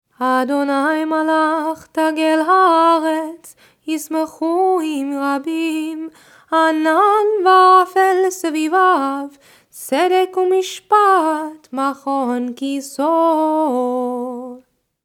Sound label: Psalm 97 Peticha opening nusach; kabbalat shabbat